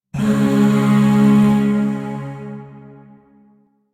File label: Shawzin Chromatic Chord B; Chords; Warframe; Zariman; Void